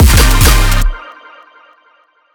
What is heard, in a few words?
color gun resample, bass